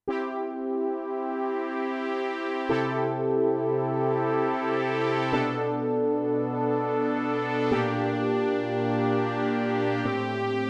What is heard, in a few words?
Poly Synth Pad